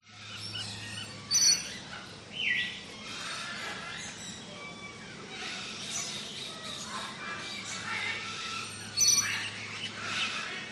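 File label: animals birds tropical large aviary ambience parrots parakeets others mower in distance; zapsplat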